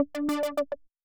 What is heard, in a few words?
polarity change; Super Polarity